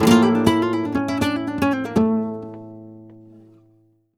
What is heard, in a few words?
Flamenco Guitar Remates De Pulgar, a